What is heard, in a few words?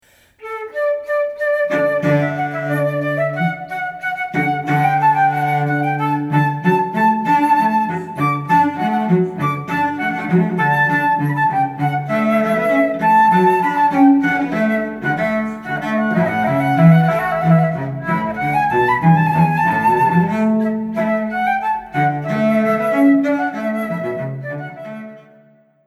La Rejouissance flute and cello; by Handel; Christen Stephens